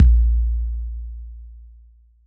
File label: jungle kick; Dr 202; Percussion